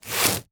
Movements Box Slide On Cement Floor Fast And Short; foley